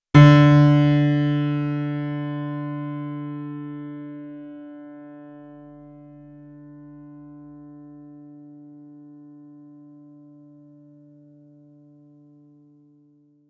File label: tuning d